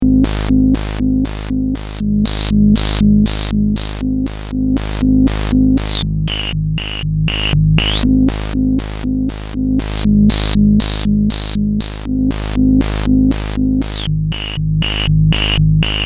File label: melodic cycle (dry); analog synth